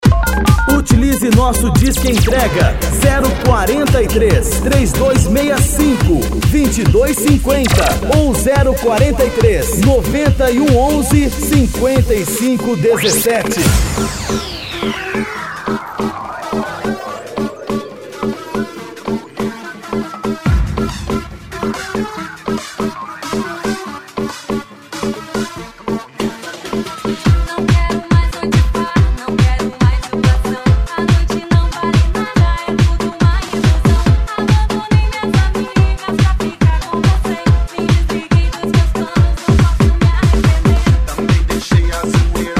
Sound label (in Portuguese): electro funk e electro dance remix